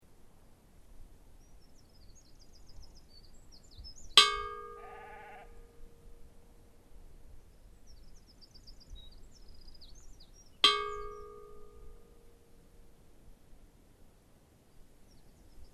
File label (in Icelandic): stadarfellskirkja saluhlid likhringing; Staðarfellskirkja sem nú stendur var vígð árið 1891